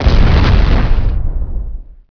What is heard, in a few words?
blast